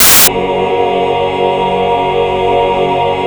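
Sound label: chord 2 1 L; Best Service Gigapack 1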